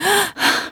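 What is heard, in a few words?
Realistic Fatigue Voice, female; oblivion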